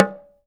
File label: TALK DRM, Heart of Africa; Spectrasonics